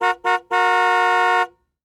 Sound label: car horn